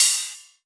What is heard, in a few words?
Rides, Cymbals